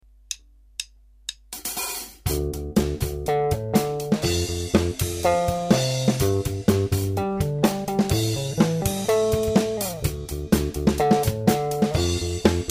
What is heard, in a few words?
1978 Ripper, gibson bass